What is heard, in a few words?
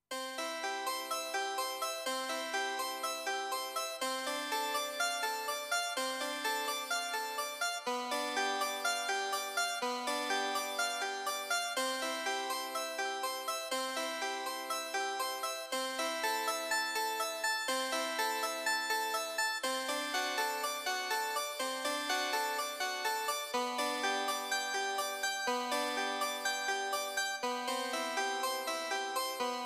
Harpsichord 3, Yamaha Clavinova CLP-270; 33. Harpsichord 3; I SUONI - GLI STRUMENTI XG